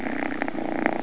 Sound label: CAT PURR; ANIMALS